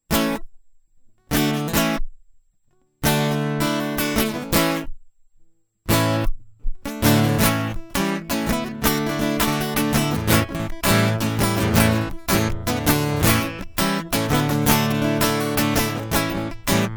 Guitar Loop

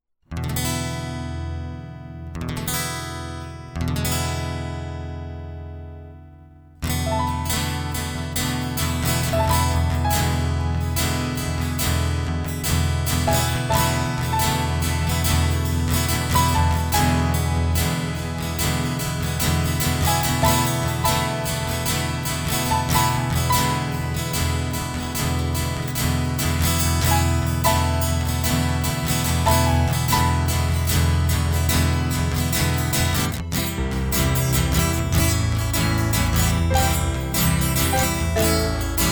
Instrumental

